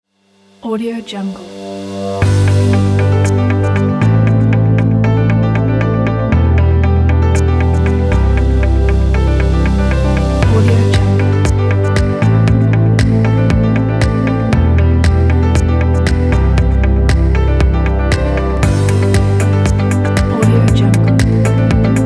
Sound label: inspiring piano background